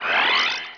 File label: holo on